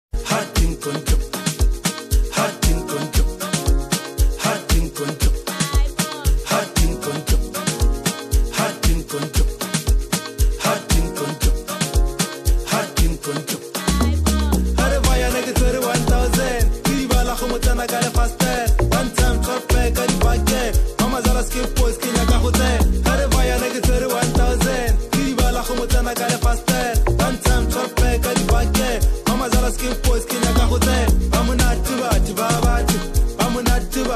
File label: Amapiano